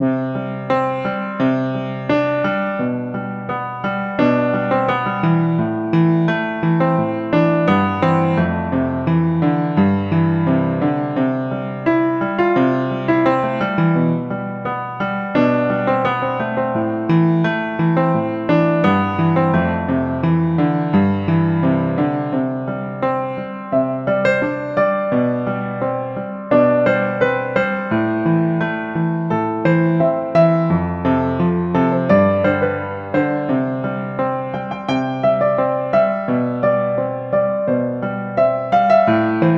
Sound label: Happy Birthday piano